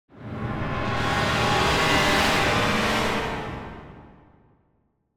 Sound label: Symphonic Hit Troubled Calls; Hollywood Edge; sound designer's toolkit v2